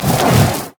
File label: zombie basic attack; LoL; brand zombie